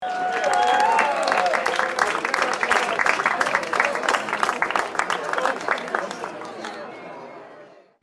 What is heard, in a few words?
applause sound